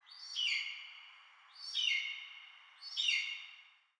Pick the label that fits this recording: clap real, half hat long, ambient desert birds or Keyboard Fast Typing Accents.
ambient desert birds